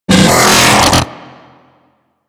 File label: Mortar Fire; Warframe; Ambulas